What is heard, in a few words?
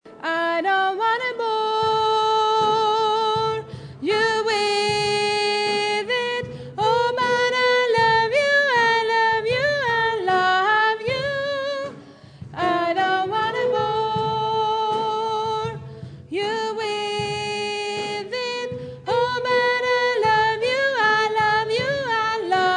tenor